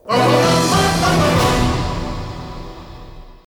Goal Fanfare; Pirates of the Carburetor; 3rd Place; Crash Bandicoot Series Sound Box

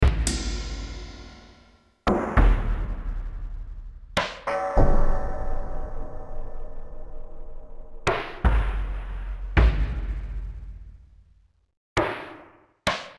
Drums; More Hylic Drums

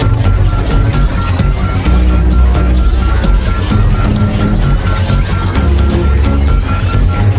Intro Danger Loop

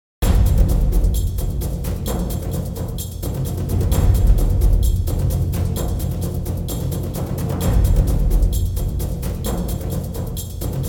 Power trailer percussion; Broforce

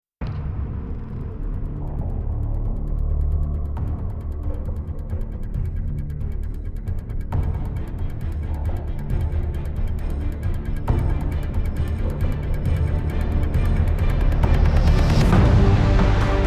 Forest Battle; Transformers: Revenge of the Fallen

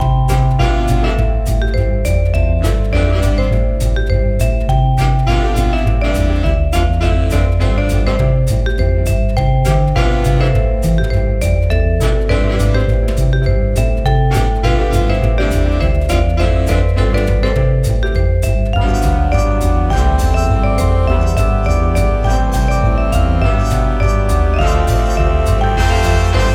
Menu Music; Vrog; PS4; Wii U; Windows

